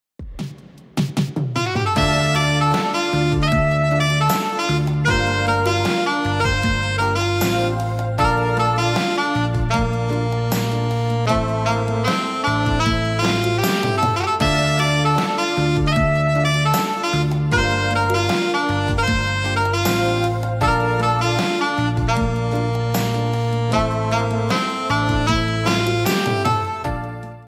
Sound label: saxophone instrumental background music ringtone version